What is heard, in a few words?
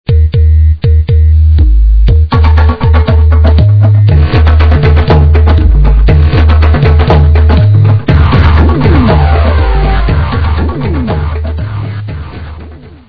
dreaming